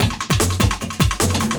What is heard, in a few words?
LOOP, 150 BPM